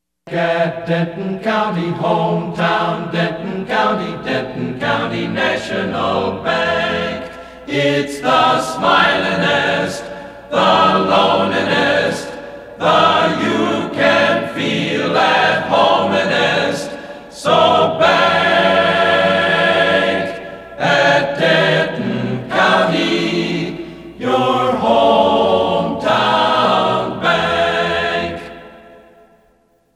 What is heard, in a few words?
Custom Acapella; DENTON COUNTY NATIONAL BANK; PAMS